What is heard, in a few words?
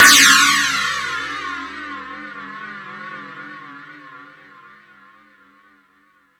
Space Drums, Drum Machines